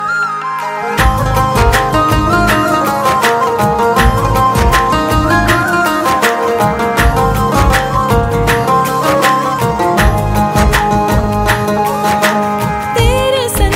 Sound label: ringtone bollywood ringtones